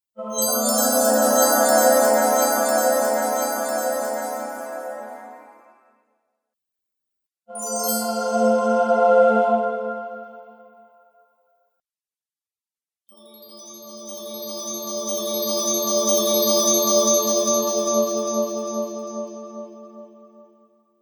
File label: Treasure Bonus Game Sounds; Video Game Sound Effects